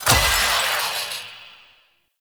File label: Lantern Destroyed; Warframe; Emissary Season Two